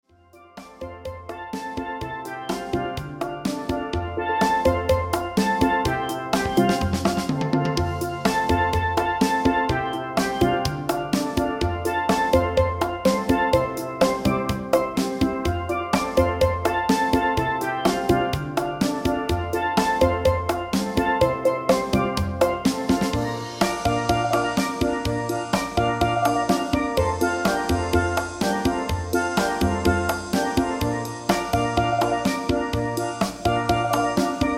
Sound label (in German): mundart medley